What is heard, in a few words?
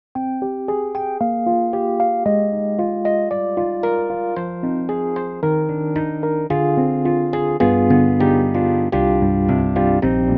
Rhodes; EDIROL; Super Quartet; Sens. Rhodes